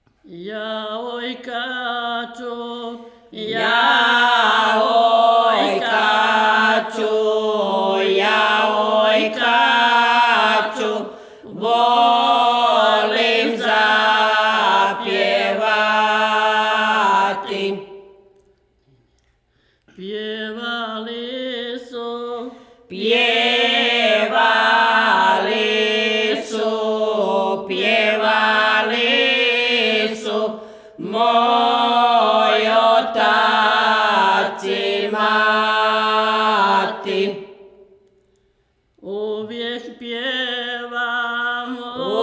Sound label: OJKACA, ojkača and the song of Krajina Serbs; I LOVE SINGING OJKAČA!; ЈА ОЈКАЧУ ВОЛИМ ЗАПЈЕВАТИ!; Kozara, Bosnia and Herzegovina